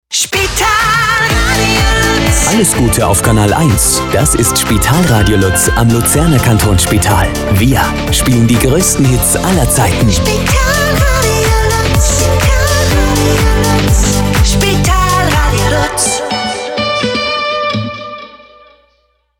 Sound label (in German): Jingle lang mit Soundlogo hinten; Kanal 1 die größten Hits